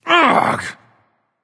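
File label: nonverbal ugh; smite; voicepack; merlin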